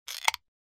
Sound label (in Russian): medlennoe otkryvnie